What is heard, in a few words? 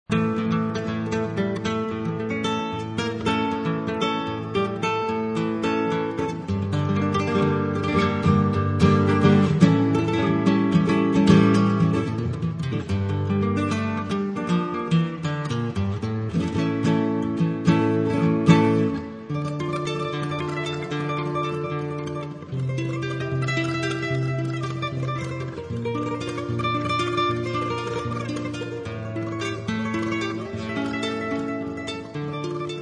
flamenco, acoustic guitar; Julian Catford